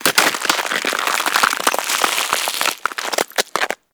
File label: Freeze Ray Frozen; Warframe; Corpus